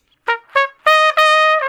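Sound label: Trumpet Slow Blues (Bb I), solo, R&B; Crimson Blue and Fabulous Horncraft 4 RnB; Horncraft for R&B; Solos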